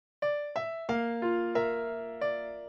PIANO

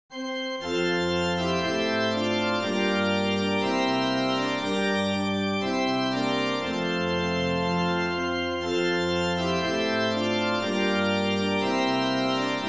organ

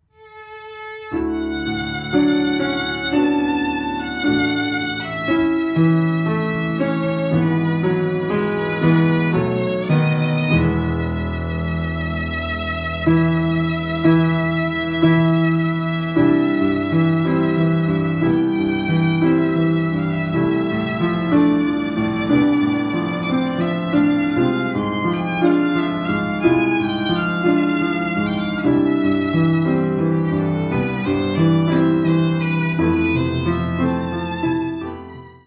Violin audio

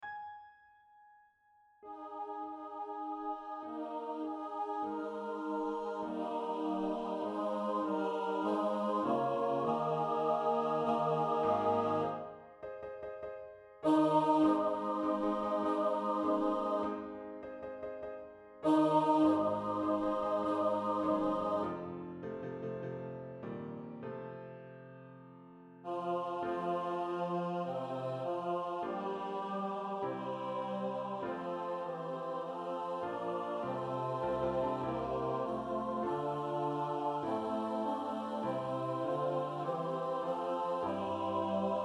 Fanfare; O Come All Ye Faithful

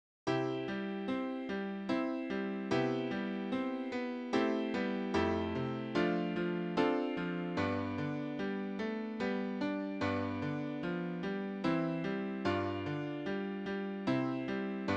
easy piano; Jesus Once of Humble Birth

